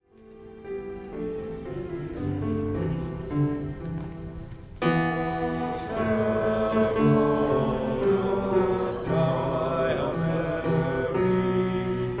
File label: bass and tenor; 881 Lift High the Cross; Gather Hymnal